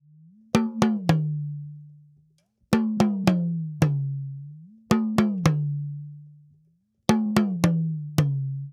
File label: Talking Drum, African; Sonic Foundry (Sony Creative Software); Continental Drift Aftershocks